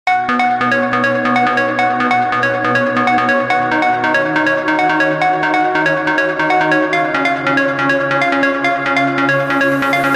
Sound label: instrumental